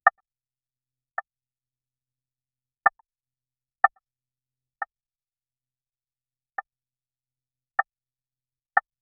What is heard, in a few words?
create a sound effect for, advance